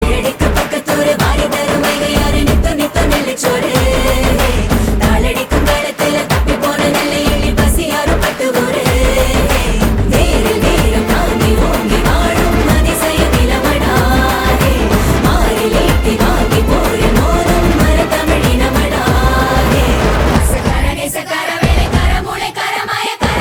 Female Ringtone

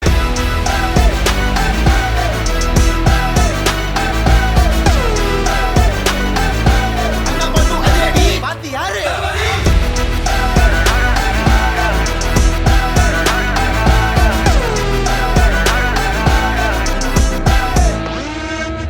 Intro BGM

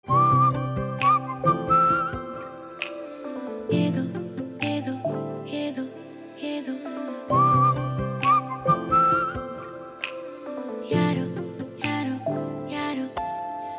whistle

